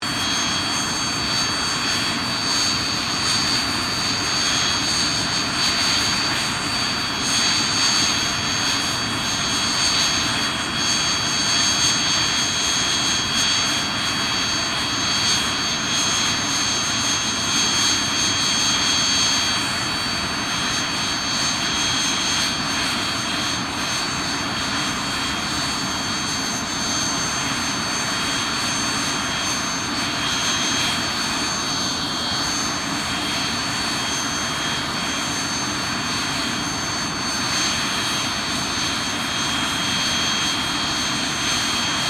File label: AIRCRAFT JET PROVOST T2 IDLE; BAC Jet Provost; Airplane Sound Effects; Airplanes (Sound Effects:Vehicles)